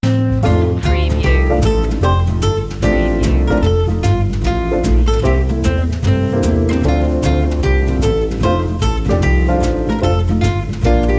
i saw three ships jazz

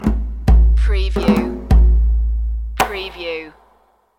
river adventure loop

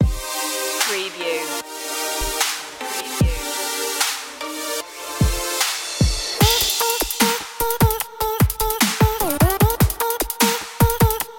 soulful shadows 75bpm

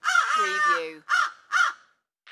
Crow Caw Multiple

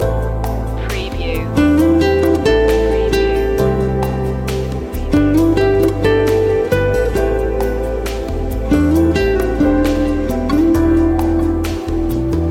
cafe vibes loop

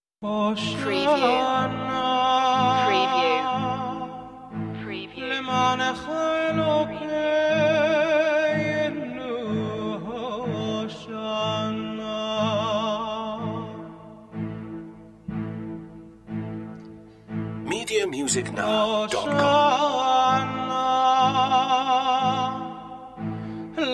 hosanna in hebrew

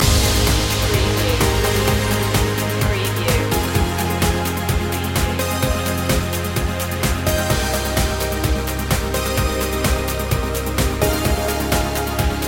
pass me by loop

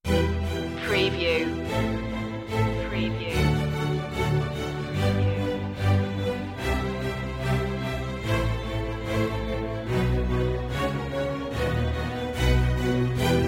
diamonds full orchestra end

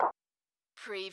close

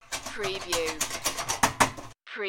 Fireplace Curtain

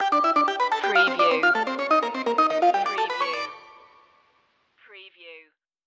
8-bit instincts stinger

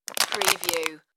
crush plastic bottle

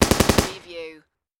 Machine Gun M240B

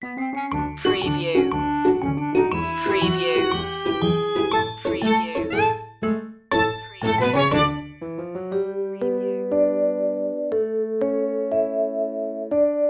classic cartoon opening theme

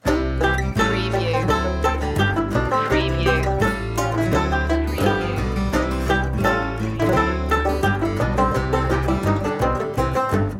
banjo rag

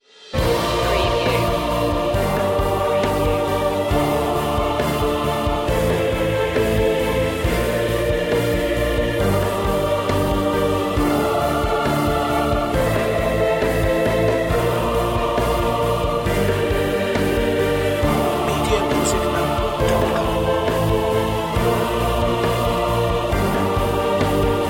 with choir intense

